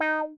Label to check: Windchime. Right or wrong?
Wrong